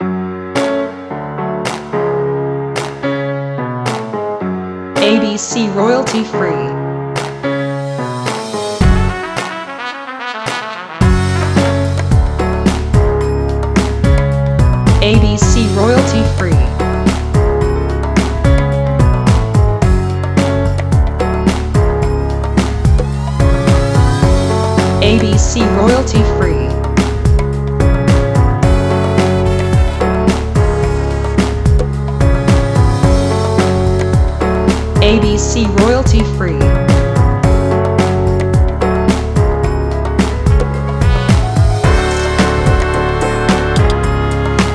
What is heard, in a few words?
Modern Latino